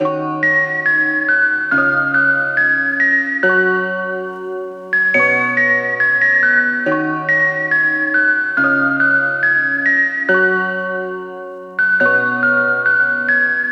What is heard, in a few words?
Melody Loop, 140 BPM E Min; Synth Loops; Cymatics; Light Free Sample Pack; Bonus Samples